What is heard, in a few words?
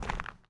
step gravel